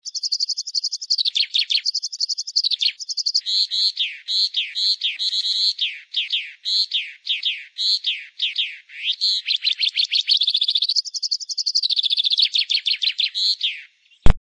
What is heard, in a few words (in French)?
pinson, oiseaux